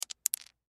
Casino Dice Roll, Casino Dice Roll Single Die Short; Dice (Sound Effects:Casinos); SPORTS